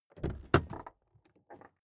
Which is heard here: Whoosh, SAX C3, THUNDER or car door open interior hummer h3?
car door open interior hummer h3